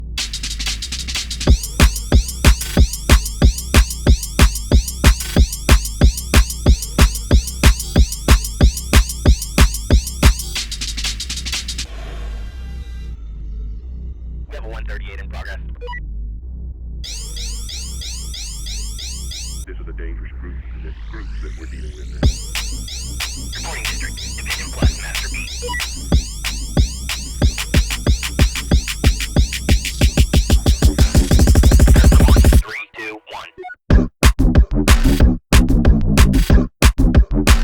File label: workout music gym tabata songs tabata workout tabata song 185 bpm version